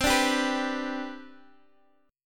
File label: C Major 7th Suspended 2nd C down Guitar DADGAD